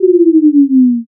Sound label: debuff